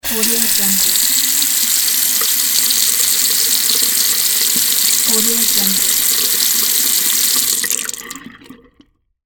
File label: sink water